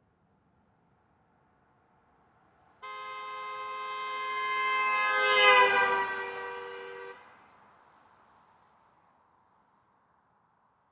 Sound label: Pontiac G6 2007 Drive By Right To Left 35 Mph Horn; Transportation Automobile; Car